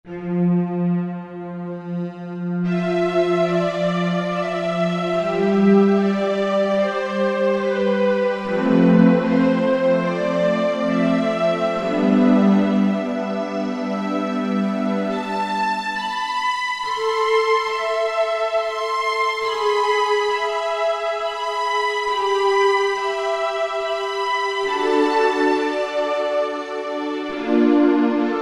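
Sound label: Artificial String, Korg Wavestation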